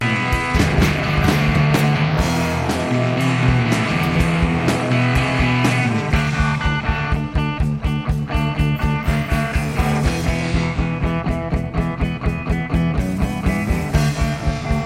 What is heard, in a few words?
instrumental